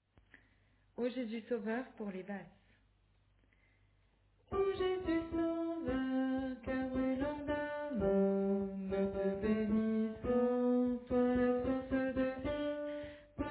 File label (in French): O Jesus Sauveur Basse